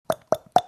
tongue clicking

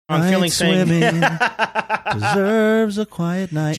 singing

